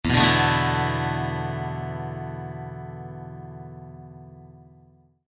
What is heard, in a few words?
Doorbell E7sus